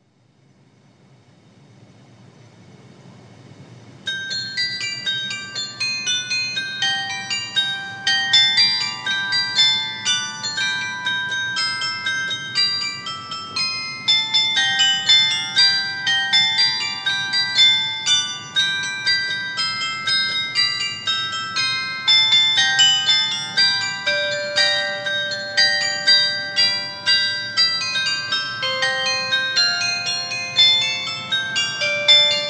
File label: carillon; Kerst